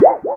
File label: wow, Sound Effects